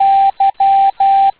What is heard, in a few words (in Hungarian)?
Távíró y